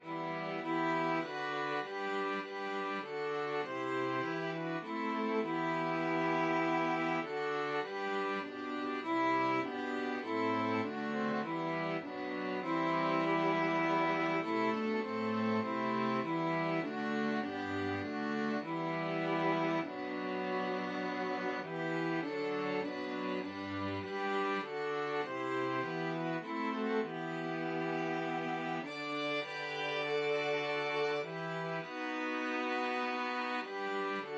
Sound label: emmanuel, string quartet